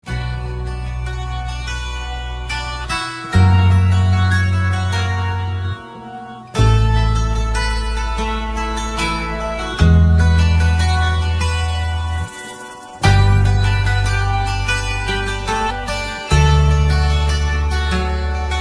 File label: instrumental